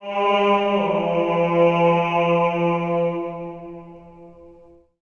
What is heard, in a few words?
chant; WeakAuras; PowerAurasMedia